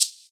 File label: dry shaker hi hat e key; percussion